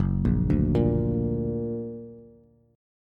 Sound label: Gb Augmented Bass; Gb+ arpeggiated